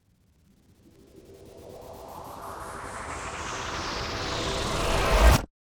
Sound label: Rise